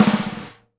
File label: SNARE RUFF, Live Snares